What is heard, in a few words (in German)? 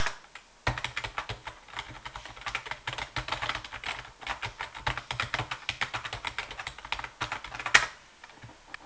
tippen